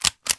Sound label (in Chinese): 枪上膛; weapon